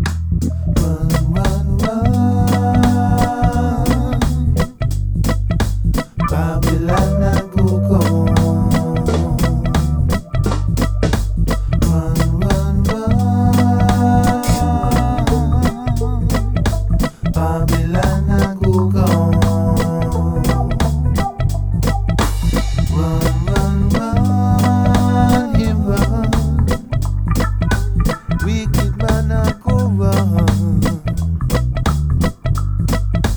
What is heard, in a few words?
unmixed; Sonarworks headphones